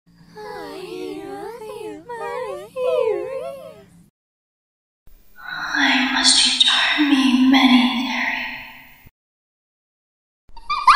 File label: horror sound creepy little girl, creepy sounds, scary sounds, sounds evil creepy